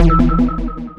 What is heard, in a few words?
die; runner